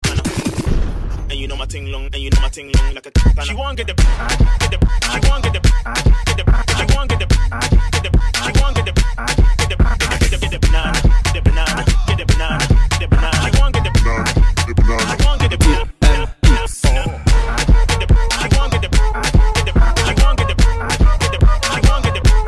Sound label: afrotwerk